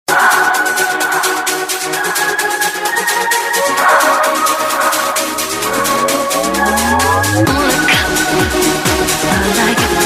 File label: Samsung ringtone